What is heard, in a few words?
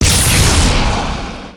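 Plasma Blaster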